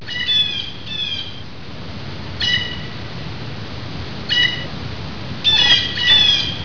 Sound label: Blue Jay Call